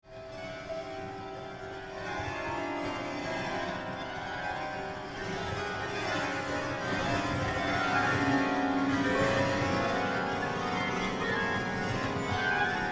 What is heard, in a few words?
piano scrape strings w fingers, Piano-Scrape Strings With Fingers; Atonal Musical Sound , Brushing Fingers On Piano Strings